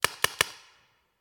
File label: Paintball Gun; Sound Ideas; Guns